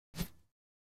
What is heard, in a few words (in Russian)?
zvuki s effektom peremeshcheniia videokamery